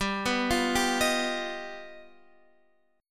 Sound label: G Augmented 7th, Guitar, Standard; G+7 arpeggiated; x,10,9,10,8,11; JGuitar